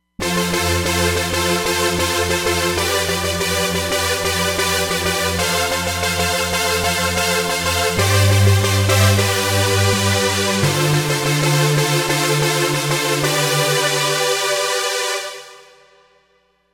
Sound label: Super Saws, Roland Fantom XR